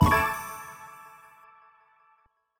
Ui Launch Game; mikrogame rotator wii u gamerip 2016

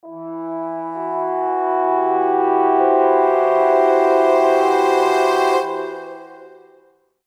Med Foreboding; sid meier s civilization revolution 2 2015 vita

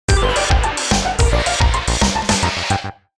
Goal Complete; The Sims 2; Nintendo DS

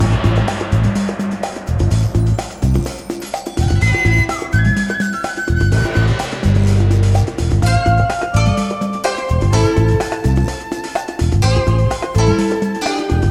radio songs; comic jumper the adventures of captain smiley xbla 2010 x360 gamerip

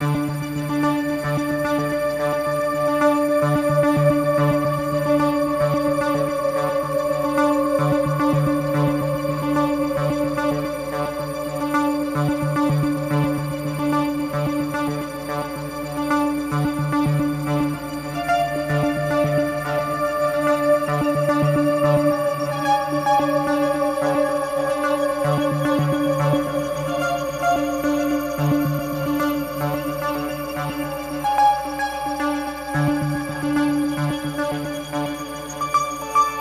Moon Patrol FX Stem Loop

